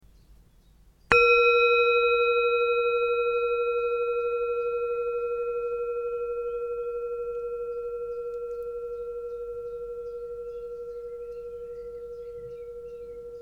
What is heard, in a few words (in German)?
Klangschale